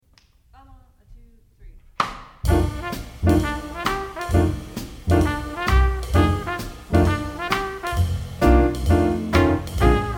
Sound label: Blues piano; All-State Jazz etude